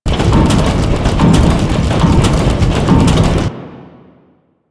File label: Gear Loop; ambience; Warframe; Grineer Galleon